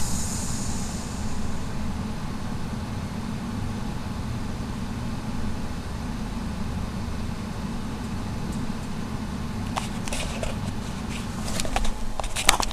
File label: blowhole closed